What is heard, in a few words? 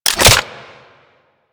Arch Rocket Crossbow Foley, Weapons; Warframe; Archwing